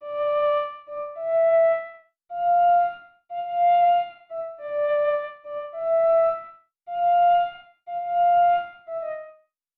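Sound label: Old Radio Flute 105 bpm F major; New Nation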